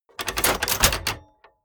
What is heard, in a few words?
mechanics small rattle